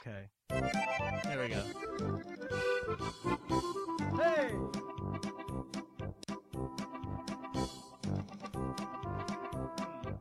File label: polka music